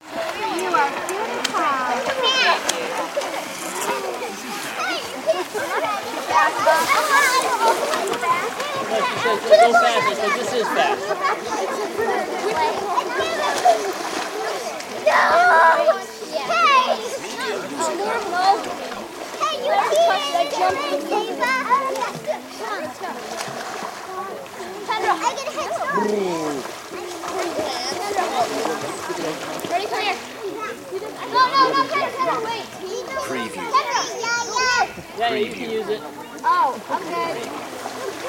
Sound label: Kids Family Lake